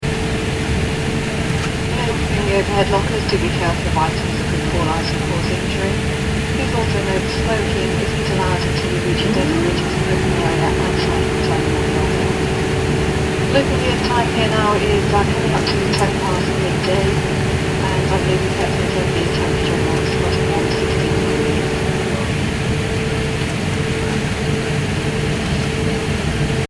transportation aircraft commercial airbus a340 interior landed taxi; Aircraft Taxi To Terminal Interior Ambience - Airbus A340 Commercial Jet; Airplanes; Vehicles; Aircraft (Airbus A340) Internal Taxiing Ambience